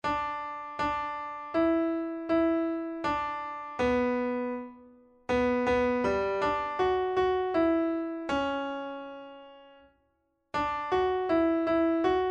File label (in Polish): oto Pan Bóg przyjdzie alt midi